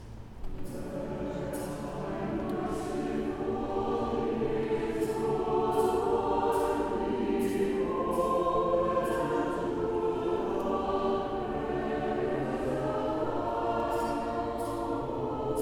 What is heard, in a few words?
Offertory motet